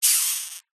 animals insect rhinoceros beetle hiss single

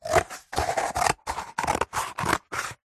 scissors cutting card tube; office

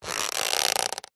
plastic creak stress; zapsplat; foley

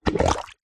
human fart bath water bubble; zapsplat

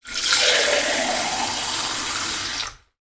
fill pint glass with water from tap; food drink; sound effects; sound effects one

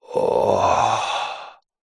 human male exhale ahh pleasure groan; zapsplat

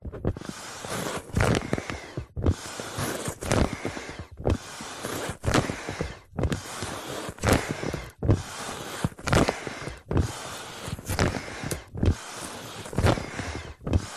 household pump foot close up pumping

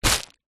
foley plastic bag empty hand grab; zapsplat

